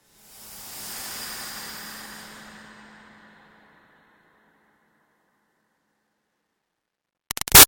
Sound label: Swell Airy Toxin Steam Release Short; Sound Design; Swells (Sound Effects:Sound Design); Big Room Sound